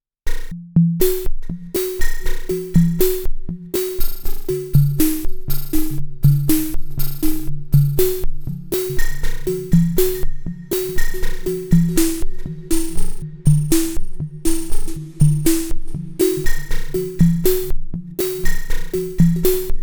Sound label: sine auto pattern; MM5 VCV A8